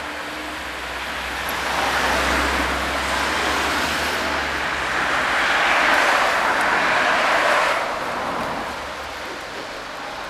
verkehr

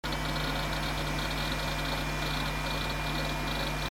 mikro nahe pc

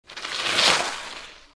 fahrrad bremsspur

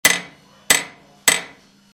hammerschlaege

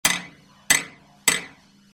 hammerschlaege flanger

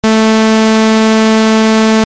saegezahn 220

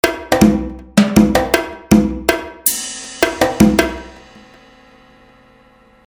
melodie

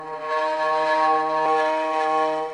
violine

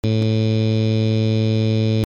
saegezahn 110, I

